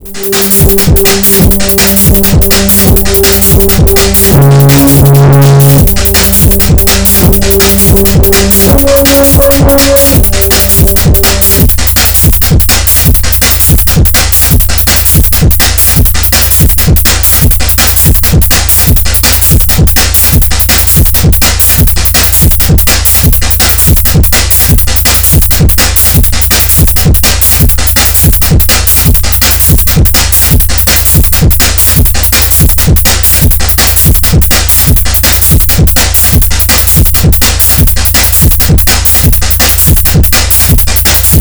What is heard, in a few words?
mangal drums